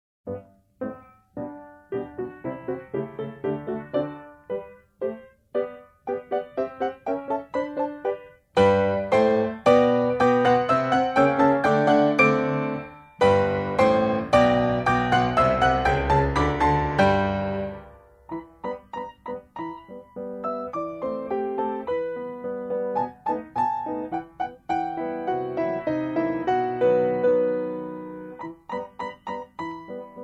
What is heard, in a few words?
Duet